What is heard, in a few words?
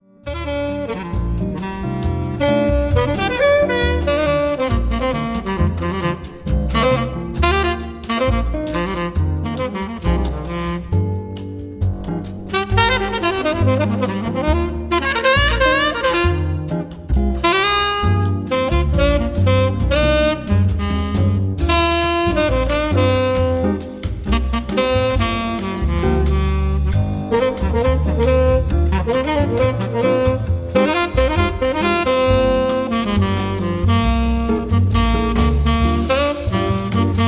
nocturnal jazz melodies